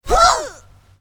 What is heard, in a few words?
Blues Hit